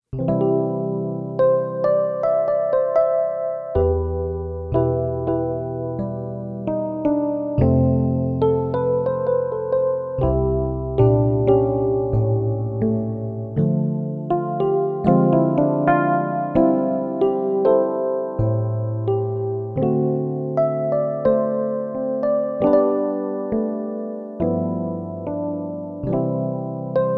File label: Electric Piano; King Stewart and Price; Laura Cummings